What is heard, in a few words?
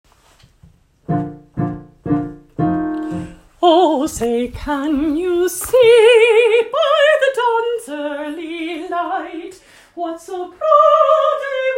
vocal